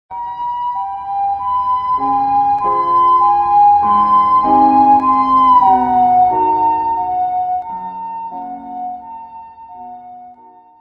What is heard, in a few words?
kyukyusha piano doppler